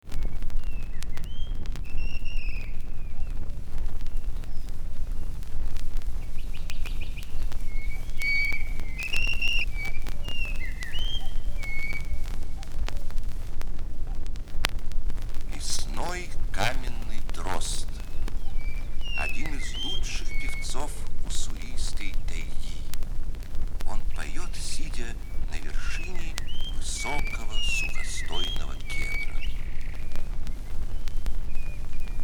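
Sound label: bird voices in nature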